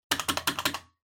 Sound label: Doorbell Mech Tone Button